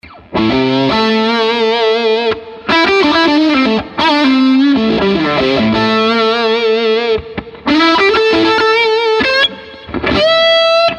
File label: humbucker click channel 6v6, guitar